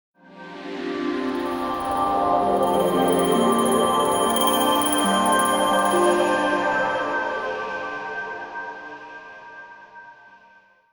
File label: Arctic clock alert; Nokia N79; Audio themes